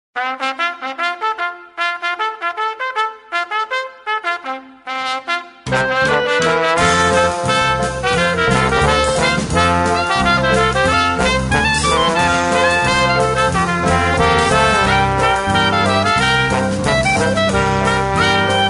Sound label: bugle boy march